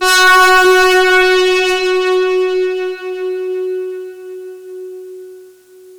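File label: big brass F#3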